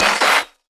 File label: hit sfx; drumkits; r.i.p. hit sfx; fl studio